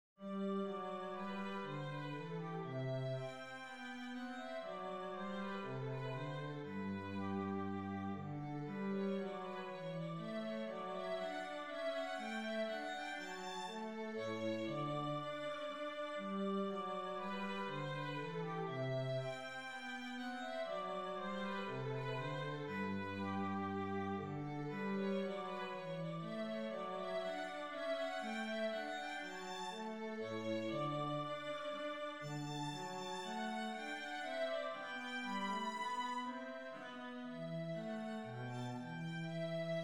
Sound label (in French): boura sol majeur; haendel georg friedrich